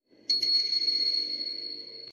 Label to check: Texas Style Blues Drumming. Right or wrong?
Wrong